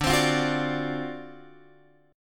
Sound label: D 7th Sharp 9th D, Guitar Open F; D7#9 strummed